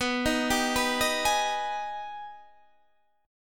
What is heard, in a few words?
Minor Major 7th; Gsharp; B; 19,18,17,16,16,16